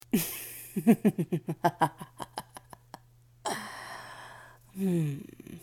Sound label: Evil Laugh; My Trademark Evil Laugh